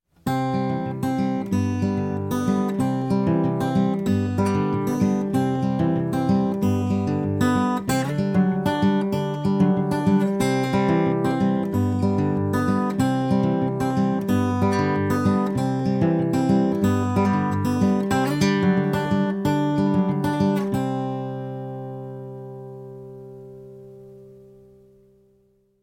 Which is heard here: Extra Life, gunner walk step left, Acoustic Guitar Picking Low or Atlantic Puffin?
Acoustic Guitar Picking Low